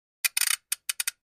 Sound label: Flash Gear Low Pitch